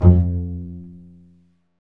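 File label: strings, contrabasses pizz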